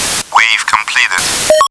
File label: waveended; GoldSrc